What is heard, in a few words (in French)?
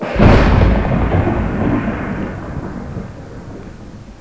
tonnerre